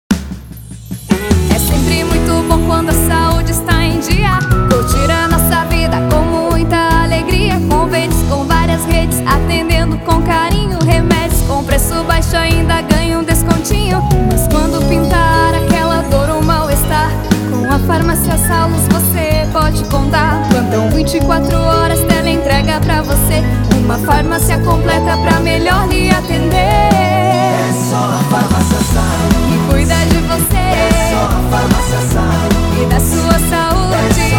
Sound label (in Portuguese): Jingle Farmacia; Sallus